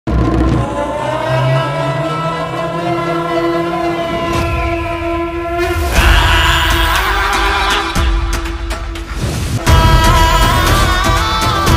mass bgm; ooru peru bhairavakona

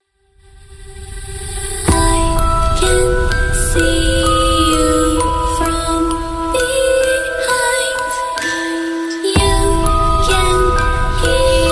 horror ringtone remix ghost ringtone new bgm

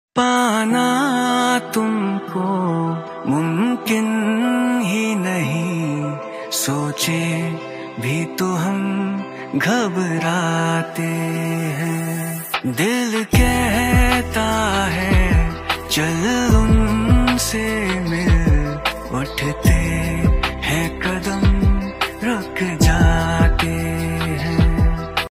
lofi

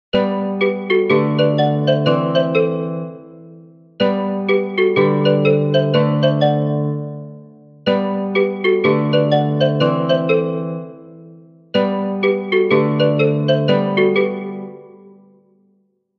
cute ringtone

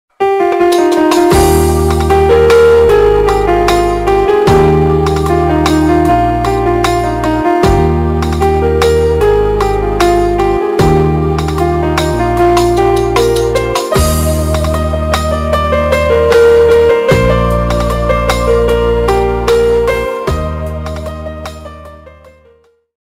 instrumental ringtone islamic ringtone

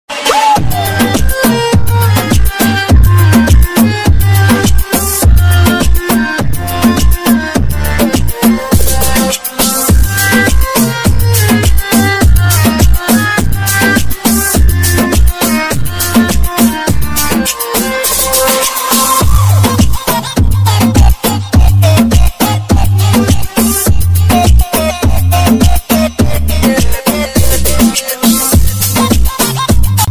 love bgm ringtone; happy valentine s day

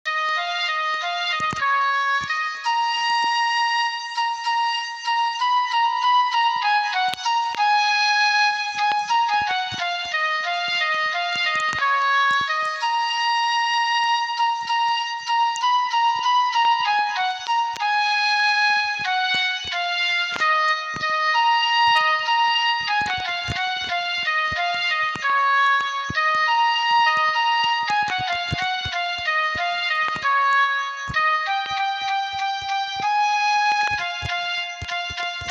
short bgm piano cover

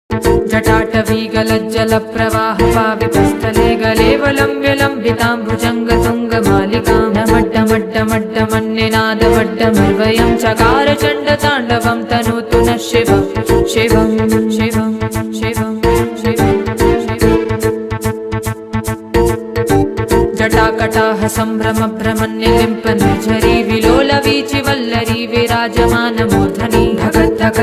stotram song in telugu devotional song; shiva tandava stotram; lordshiva

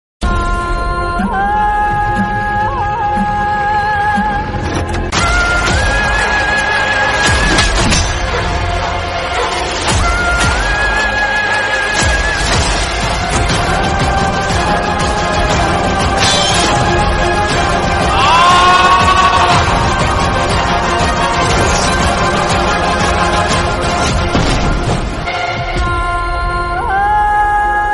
horror bgm; virupaksha

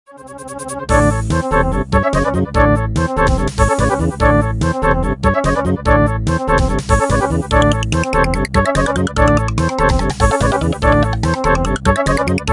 nokia ringtone

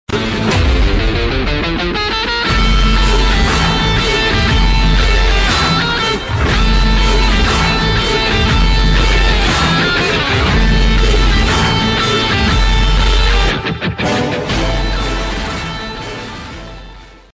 mass bgm ringtone telugu bgm ringtones

